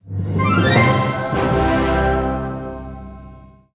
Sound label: Chest Chime; cat quest ii